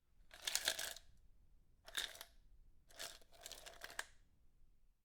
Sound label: freezer ice into plastic cup